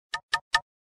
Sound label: Knock; KM570 Cookie Gig